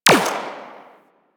Rifle Fire

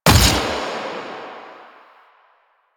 Fire Shot; Incarnon Sybaris Prime; Warframe; Weapons; Duviri; Incarnon Adapters

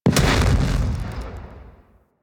Explosion Small; Warframe; Weapons; Thanotech

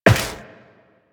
Step Metal, Footsteps Walk; Warframe; Tenno

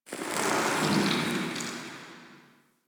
Galleon Pipe Sizzle Amb; Ambience; Warframe; Grineer Galleon

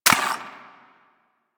Braton Prime Fire End; Warframe

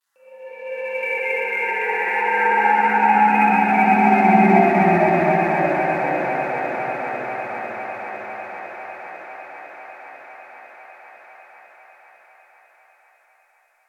Sector Wars Rand Amb, Ambience; Warframe; Tenno

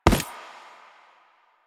Butcher Step Default, Footsteps; Enemies; Soldiers; Foley; Warframe; Lasrian

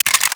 Burst Pistol Clip Out; Warframe; DE; Weapons

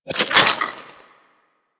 Inf Throwing Star Reload, Infested; Warframe; Weapons

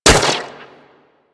Speeder Rifle Fire

